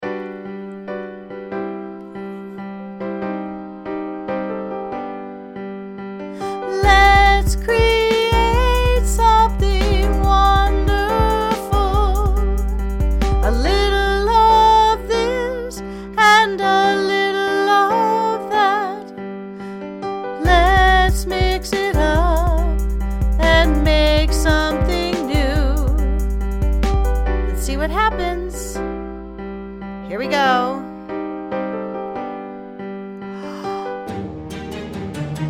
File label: vocals